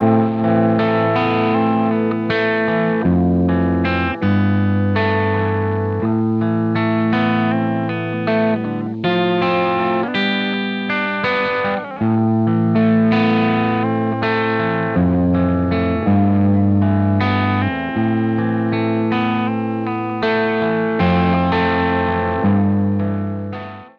gitarre ohne; virsyn reflect

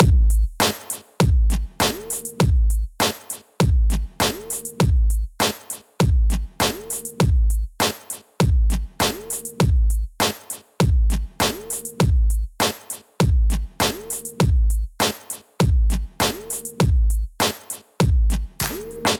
beat mit paralleler kompression 1176LN

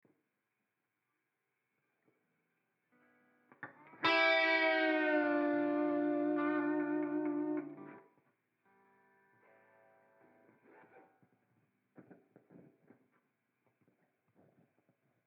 mesa triple rectifer 3 plus harmonizer ohne delay; ik multimedia amplitube 4

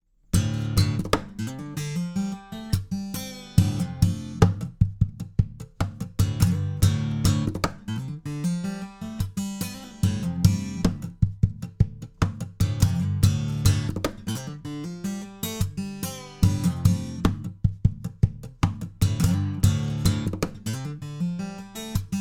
perkussiv, fame pro series sd-100

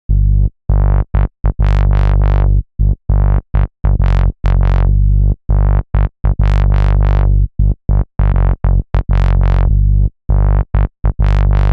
bass sequenzer low pass, moog multimode filter; universal audio

